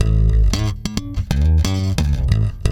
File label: SFNK2 A#, Bass, FUNK; Spectrasonics; Bass Legends